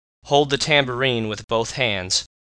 tambourine

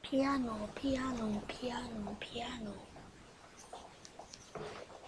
piano

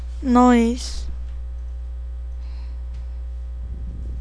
noise

